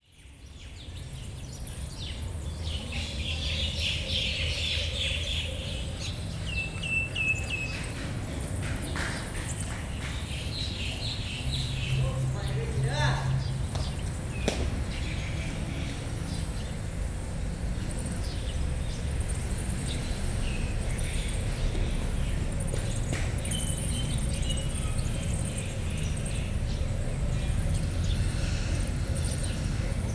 City park of temple birds and light traffic away, Thailand; Charlie Atanasyan; Faunethic